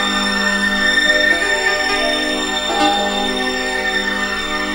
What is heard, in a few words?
Pad; Blu Mar Ten; Jungle - 1989 to 1999